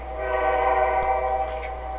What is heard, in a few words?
B32 Horn, train; rrsounds